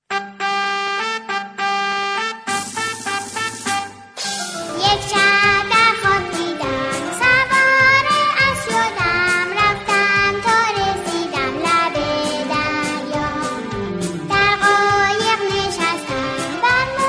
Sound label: Music Guitar Music, Child Music